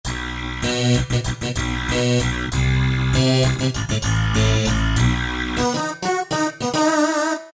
Gotham Low, Roland PN-D50-01

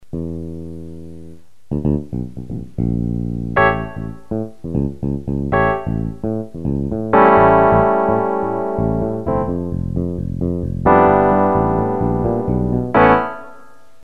El. Piano

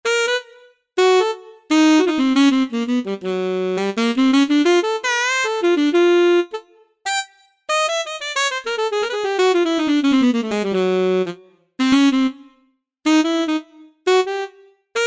Alto Saxophone; synthesizer; Guess the synthesizer game

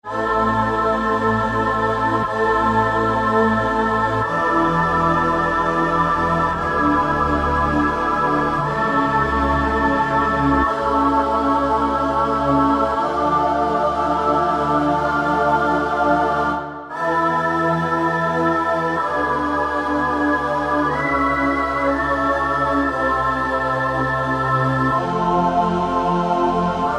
Chamber, Yamaha TG77; Factory Presets

